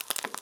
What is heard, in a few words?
Frying Bit